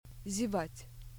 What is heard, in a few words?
yawn